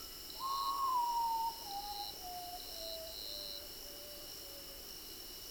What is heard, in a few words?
Common Potoo